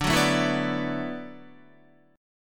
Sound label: D 6th Add 9th D, Guitar; D6add9 strummed; JGuitar